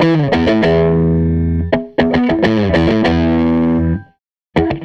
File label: SG Guitar Loop; Sonic Foundry; Six-String Orchestra